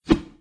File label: swish, basketball; Nickelodeon Basketball Stars 3; ThrillGames